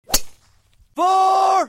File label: Golf driver fore